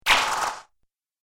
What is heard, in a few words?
Laser Alien Zap, laser gun